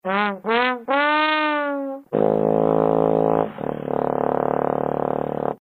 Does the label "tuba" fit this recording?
Yes